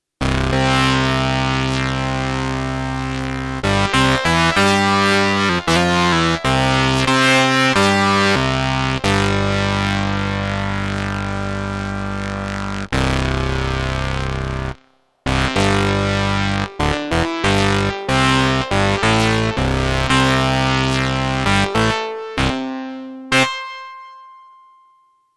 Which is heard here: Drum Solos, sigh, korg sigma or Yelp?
korg sigma